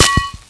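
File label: grass; Mafie 1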